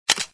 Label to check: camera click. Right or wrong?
Right